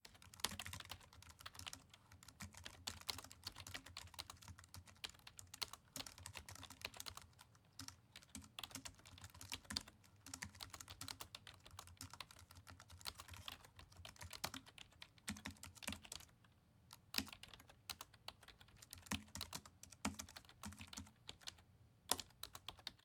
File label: Typing; LOOPERS